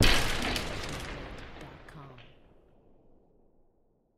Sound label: Explosion Blast with Debris; SoundsCrate